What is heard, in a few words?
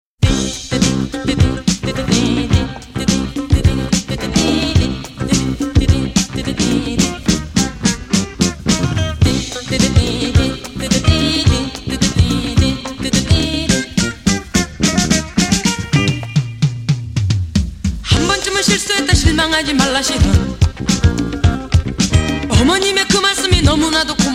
funky Korean soul